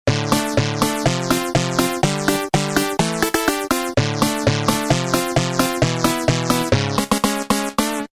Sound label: Trance Stab; Roland M-DC1